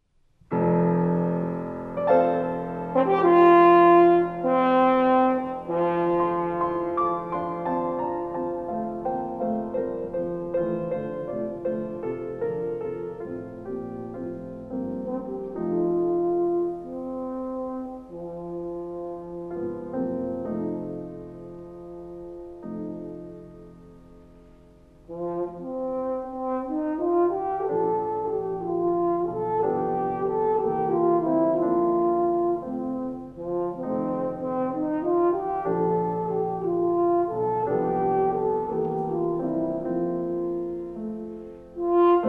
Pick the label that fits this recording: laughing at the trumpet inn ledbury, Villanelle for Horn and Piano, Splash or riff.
Villanelle for Horn and Piano